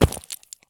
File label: landing slate; Escape from Tarkov